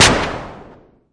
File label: mbrute noiz, Arturia MiniBrute